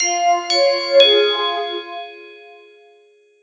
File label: melody